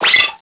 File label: take aim; kronos1; cstrike